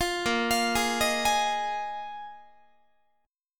A sharp 7th Suspended 4th, Guitar; A#7sus4; arpeggiated; 20,18,20,18,19,19

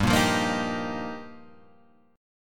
G Minor 7th Sharp 5th; Gm7#5 Chord {3 1 3 3 4 3} strummed

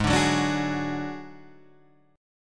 F sharp Suspended 2nd Sharp 5th

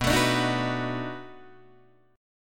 B 7th Sharp 9th, down, Guitar, Open D

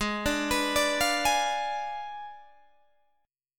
G sharp Diminished 7th G sharp, Guitar Open G

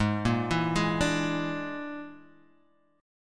E 7th Flat 5th, Ab

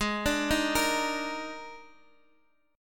D sharp Major 7th Suspended 4th G sharp

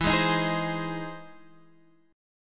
E Augmented Major 7th E down Guitar Standard; strummed; E+M7; JGuitar

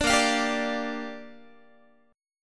A sharp 6th, Guitar; A#6; strummed

